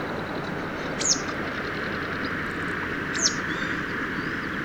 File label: male flight call